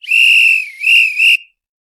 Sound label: Whistle